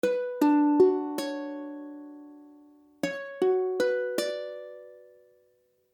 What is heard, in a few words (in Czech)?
Hmadd9, akordy ukulele; H akordy (=B akordy)